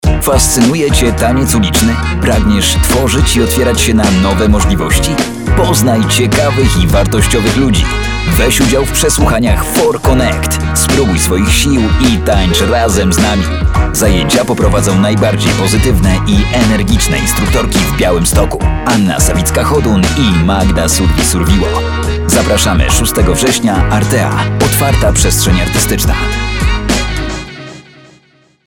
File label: hiphop